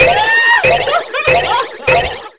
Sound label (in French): RIRES; Mobiclic46